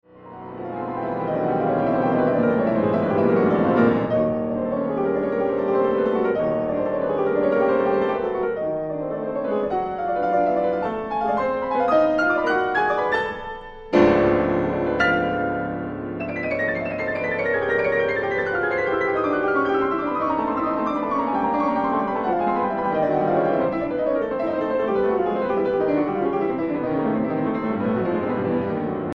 Preludes for piano Prestissimo Meno mosso

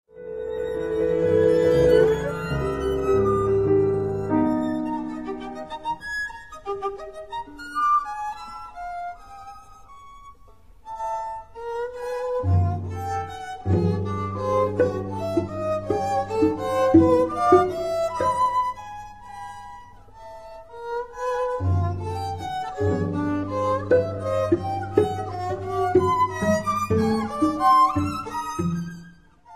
Trio for violin, cello & piano Maestoso; Helps

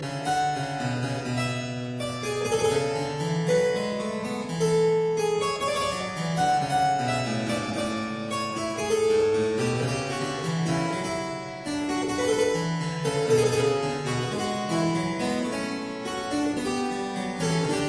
Suite in E flat major, Sarabande

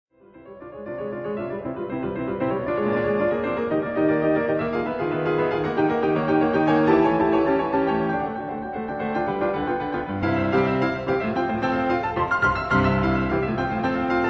Toccata for piano in C major

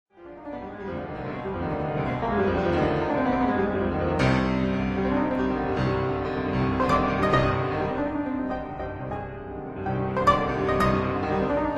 Etudes for piano in C minor

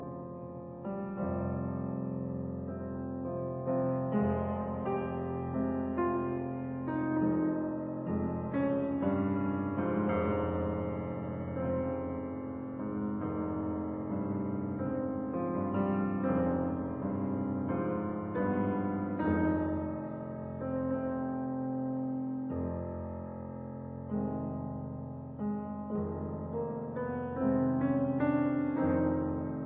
Piano Sonata in F minor, Funebre

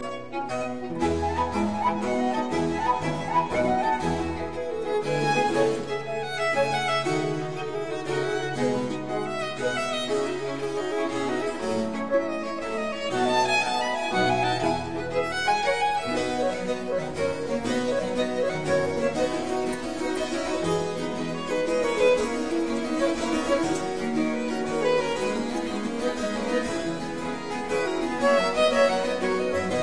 Quatuors Quartet Allegro; No 2 in F major; TWV 43F1

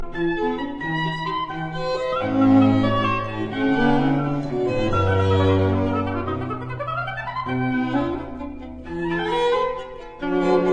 Oboe Quartet in F major Rondeau; K 370

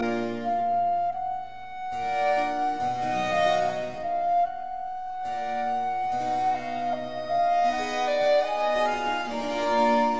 Quatuors Quartet Adagio; No 5 in G major; TWV 43G5

